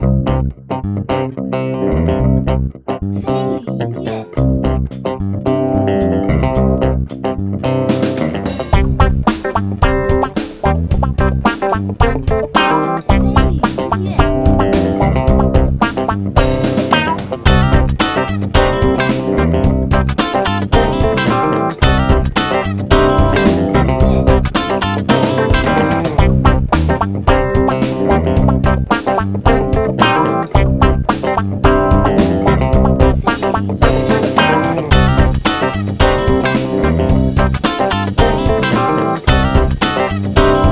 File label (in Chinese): 休闲-爵士-轻松